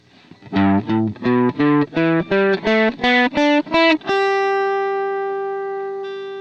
G pentatonic box, guitar

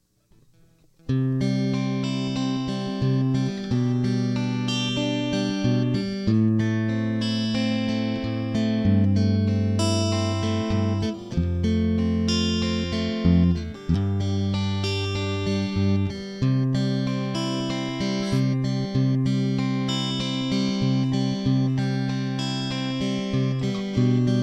desc C G B Am Am G F G, guitar

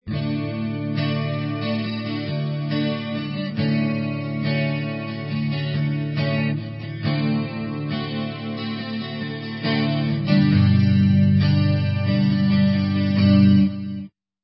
D Dmaj7 D7 G, guitar

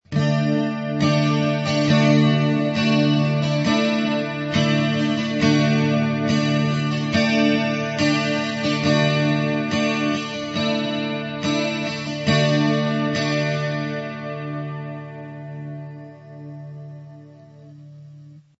Dsus D D2 D, guitar

